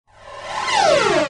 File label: win low; games; poker; American Poker The Legends II